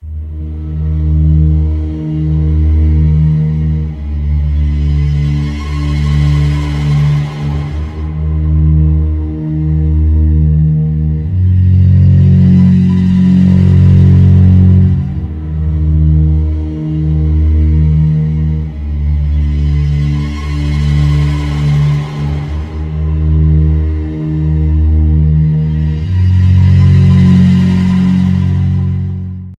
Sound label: Background Sequence; Clockwise; Windows